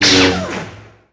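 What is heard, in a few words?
Light Saber sabre, Contacts avec d'autres sabres; effets speciaux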